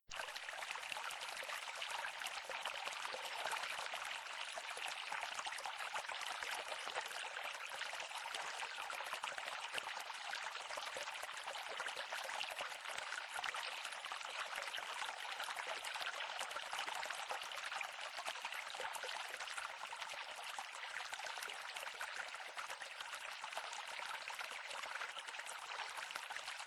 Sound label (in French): petit ruisseau